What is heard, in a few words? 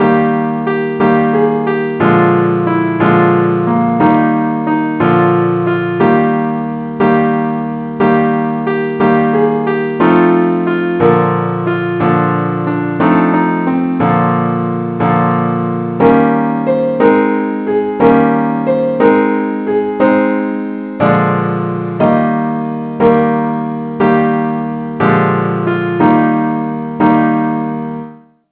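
simple accompaniment keyboard; key ees; angel voices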